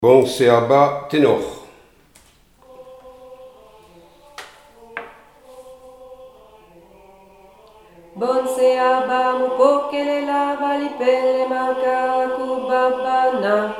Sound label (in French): chant tenor; Bonse Aba